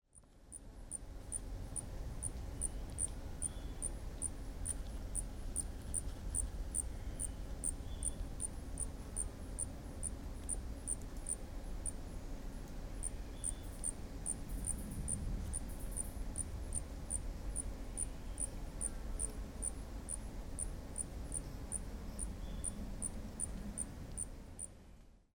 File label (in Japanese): otibakoorogi call